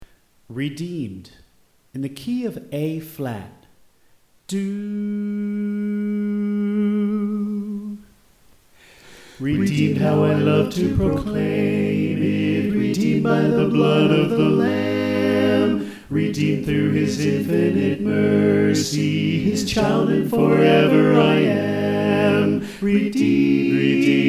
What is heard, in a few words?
Alto Left